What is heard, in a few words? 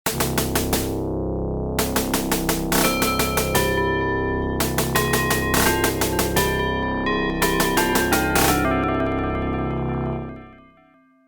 menu; fpv